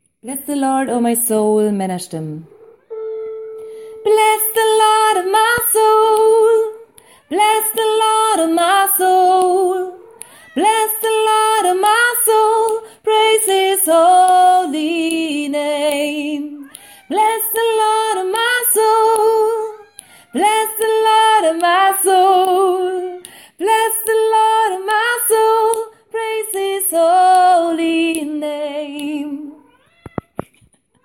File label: maenner